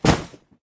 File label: Landing Hit; Pajamas; smite; kumbhakarna